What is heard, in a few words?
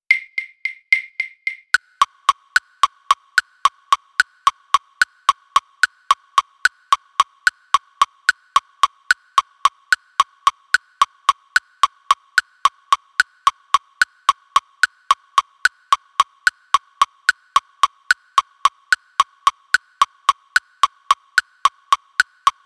click